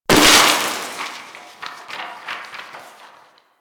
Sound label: Desktop Book Destroy; Warframe; Ambience; Entrati